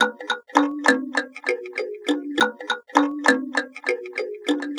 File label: Mbira Kulfi, Acoustic Beats; Sonic Foundry (Sony Creative Software); Myths of Technology